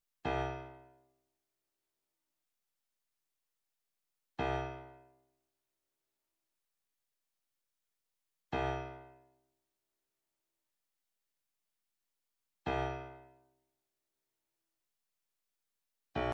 116 accent snare; Spot On

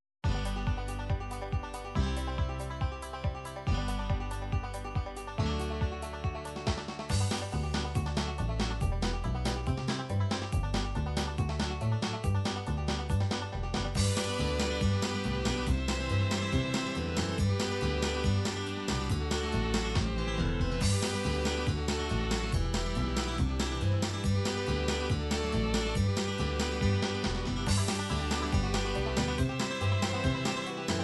bluegrass, midi

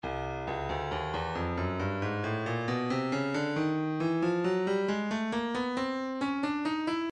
breakbeat 068 bpm; Stylus Breakbeat Midi Files 065 069 Bpm; Daffy B

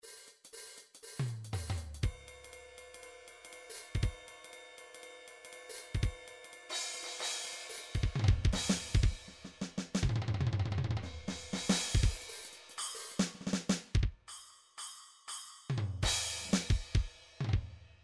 drums jazz, midi drum patterns

